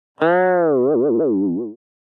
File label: Cartoon Effect Guita